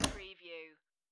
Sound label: Poker Chips Place One Chip Onto Another On Wood Table